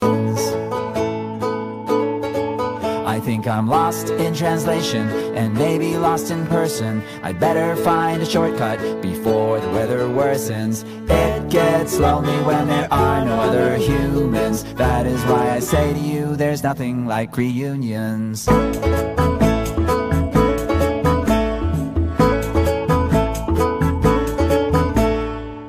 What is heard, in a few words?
samsung galaxy note ringtone